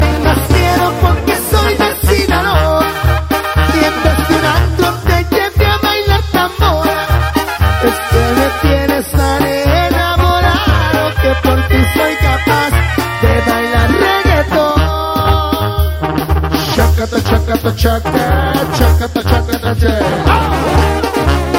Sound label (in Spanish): reggaeton en banda